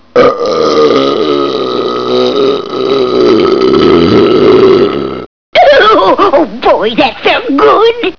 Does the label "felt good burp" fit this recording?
Yes